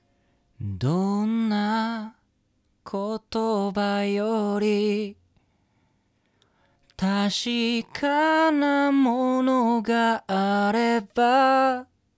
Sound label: SENNHEISER MD421